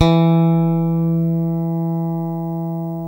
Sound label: JAZZ, JAZZ BASS